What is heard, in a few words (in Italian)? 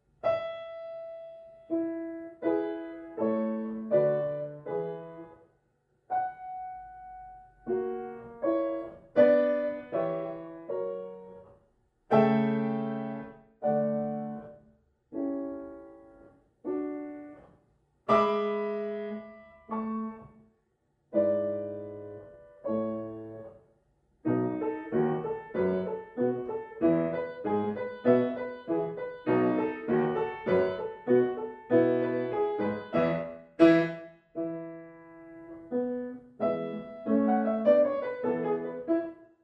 Andante; La vendetta di Venere nr 24